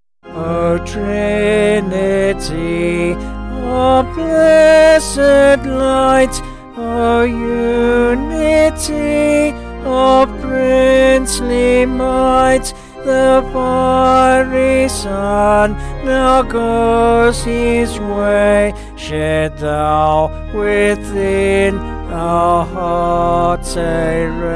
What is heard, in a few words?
O Trinity Of Blessed Light, Hilariter